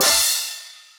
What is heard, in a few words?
piston; patatap